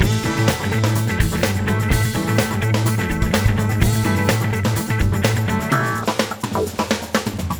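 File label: Music Classic Loop; Pictionary; NDS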